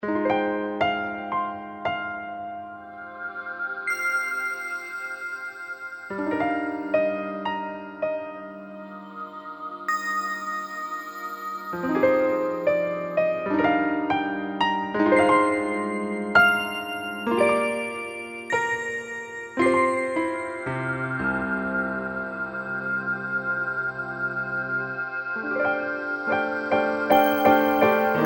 story sad; ensemble girls; happy elements k.k; android

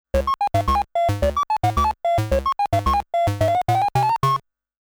Game Start Music; Namco Classic Collection Vol.2; arcade soundtrack

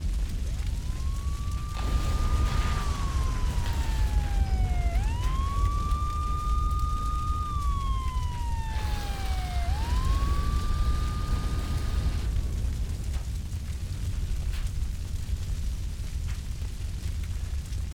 Extinguish Loop; Virtual City

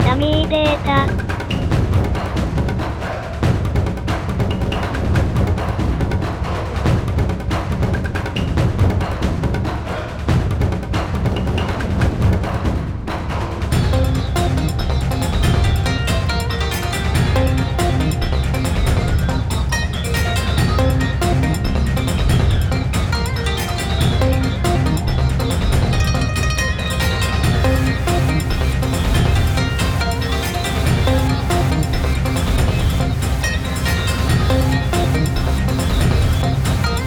jingle top; Taiko no Tatsujin Drum 'n' Fun

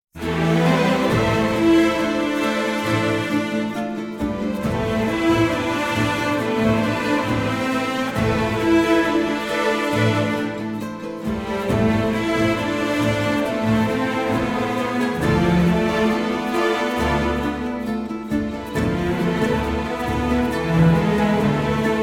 Music Battle

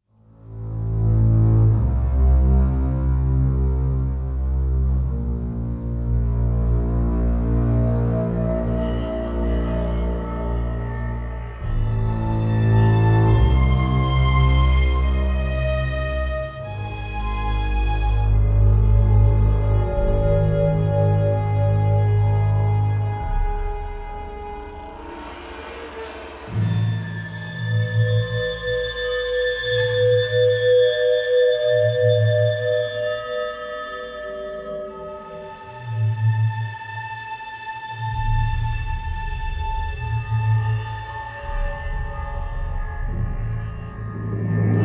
Cave (Ambient); Crysis 3 re-engineered soundtrack

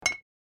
Jam Landing; my cute commissar 2020 gamerip

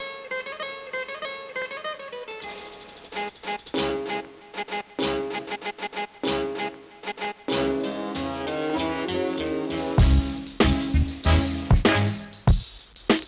BGM; madden nfl 2002; gba

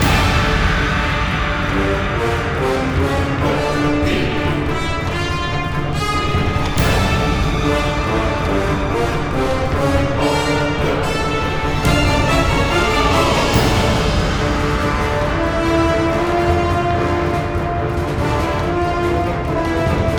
Creepy Action

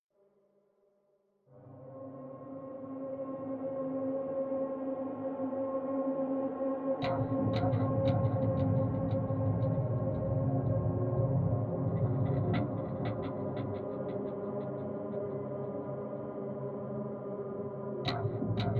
Ryogoku Ambient; modern combat 5 blackout; android

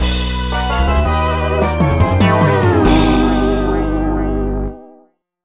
Cleaning Failed; fossil fighters; nintendo ds

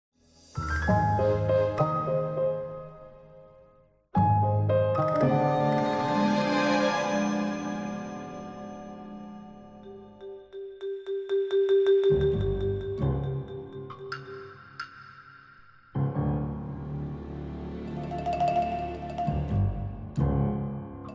Crimedy Tension Scene; Disney Infinity

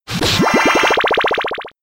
Drain Punch; Pokemon; Gen 5; Blk Wht Blk2 Wht2

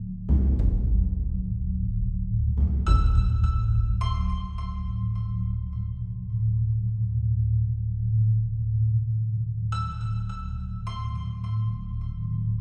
music intro cutscene loop

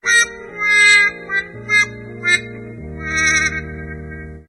Sad melody

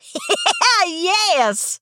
laugh

